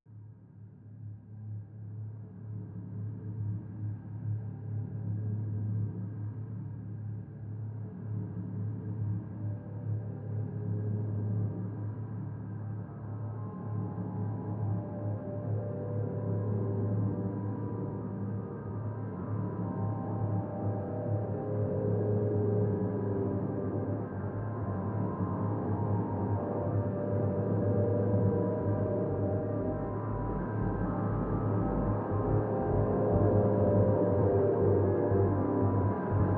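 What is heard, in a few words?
drone G; Drn Bleeding Out G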